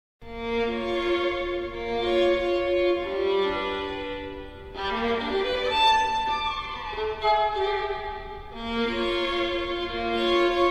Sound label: live violin